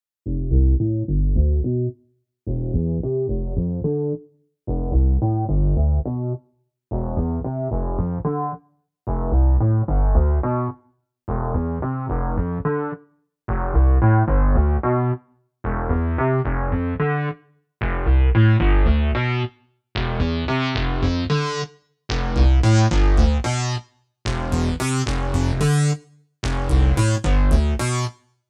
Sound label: Big Reso Bass